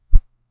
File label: playing card flip sound